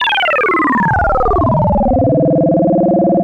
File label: Osc vs Ring Mod, vintage oscillator; radiosonics